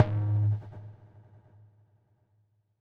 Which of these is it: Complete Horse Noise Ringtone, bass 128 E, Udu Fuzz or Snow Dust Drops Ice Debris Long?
Udu Fuzz